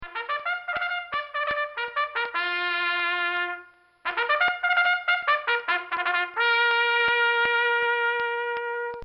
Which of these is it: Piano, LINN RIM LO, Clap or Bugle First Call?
Bugle First Call